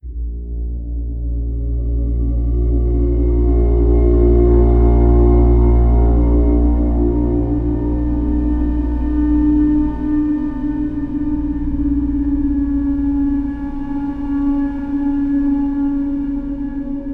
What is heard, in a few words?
Music Oneshot Generic spooky; Cursed Mountain; Wii